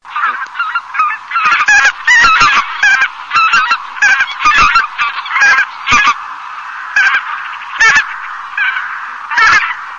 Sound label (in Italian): OCA LOMBARDELLA